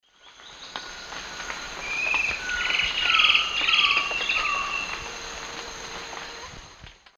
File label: Xiphorhynchus ocellatus, song; S. America; Eastern Lowlands